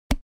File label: favorite unclick